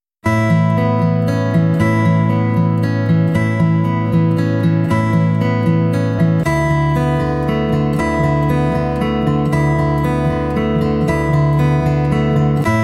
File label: american waltz, easy listening